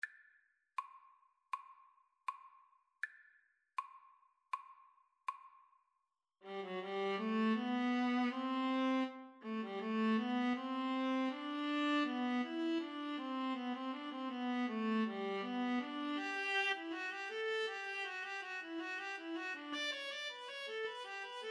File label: bach invention 5 bwv 776, Viola Cello Duet